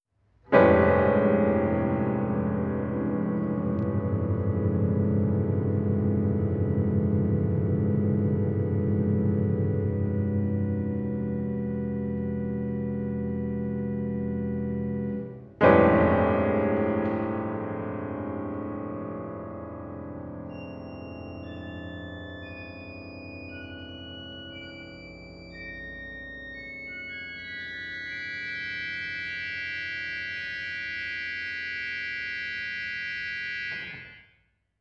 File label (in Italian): Organo e Pf; Organo e Pf riv